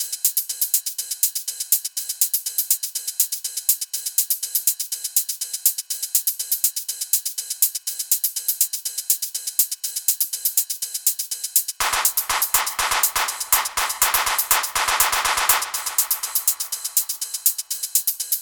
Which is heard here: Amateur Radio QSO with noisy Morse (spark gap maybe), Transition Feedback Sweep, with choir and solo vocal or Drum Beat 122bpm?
Drum Beat 122bpm